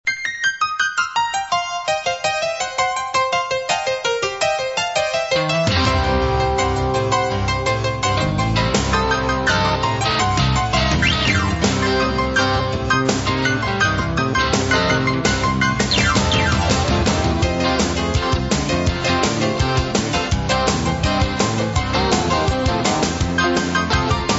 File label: Good Old Rock n Roll